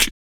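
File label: vox percussion, Vocal Planet Jazz FX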